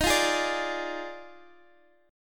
D sharp Major 7th Suspended 2nd Suspended 4th, Guitar, DADGAD